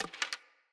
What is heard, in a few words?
Bow Ranged Equip; Weapons; Envoy Hunter Bow